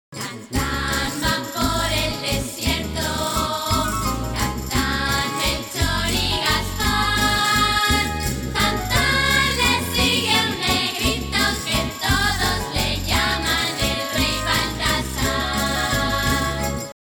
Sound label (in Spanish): estrofa; forma estrofica